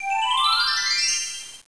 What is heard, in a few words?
upchime